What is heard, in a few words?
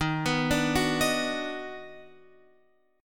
Eb Minor Major 7th Sharp 5th, down, Guitar, Open F; arpeggiated; chord; JGuitar